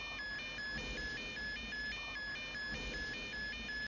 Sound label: Missile Lock